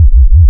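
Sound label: waveform FM sine sine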